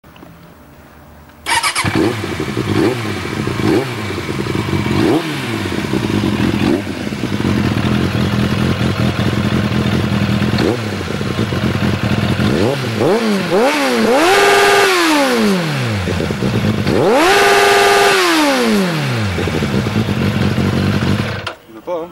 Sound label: pot ixil super racing